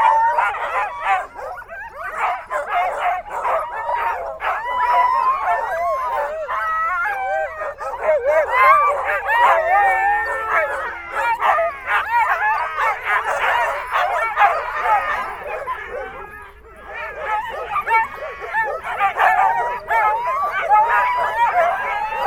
szánhúzó koncert, kutya; állathangok; paraszt udvar

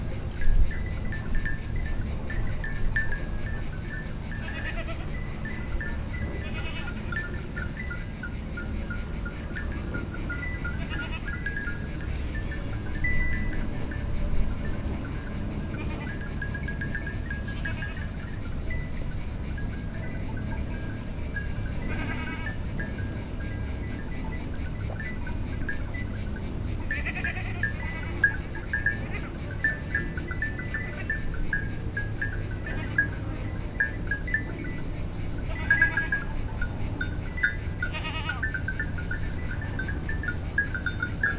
egy hegyoldalrol hallhato kecskes ut, gorogtaj thassos

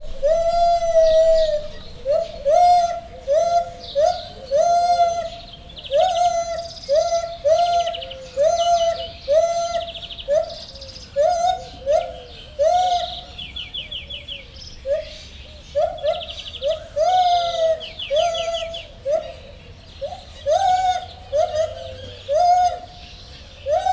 szóló jobbról, fehérkezű gibbon; jaszberenyi zoo 2016

